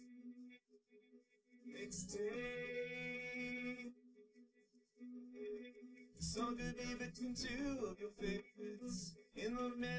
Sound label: drums, live rec